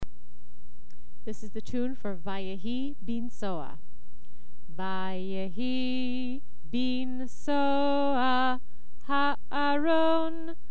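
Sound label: Vayehi Binsoa Traditional melody chanted slowly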